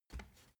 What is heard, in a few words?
step ladder wood single